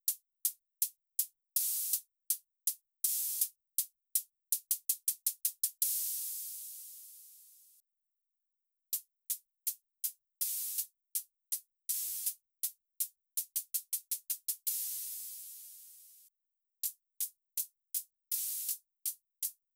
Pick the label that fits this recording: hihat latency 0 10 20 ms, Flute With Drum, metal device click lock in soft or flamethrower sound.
hihat latency 0 10 20 ms